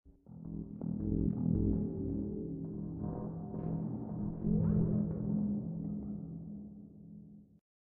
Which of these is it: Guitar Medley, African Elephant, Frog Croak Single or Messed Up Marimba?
Messed Up Marimba